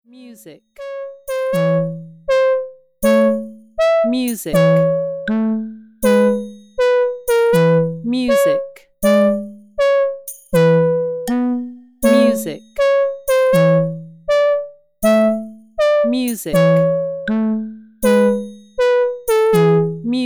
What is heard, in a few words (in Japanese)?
動物動画用BGM